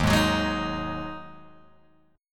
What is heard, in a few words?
Eb Minor Double Flat 5th Eb Guitar Open G